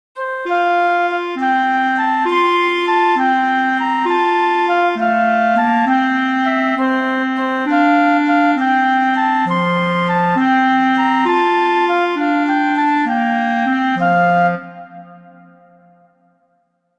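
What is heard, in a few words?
I Saw Three Ships, Christmas flute quartet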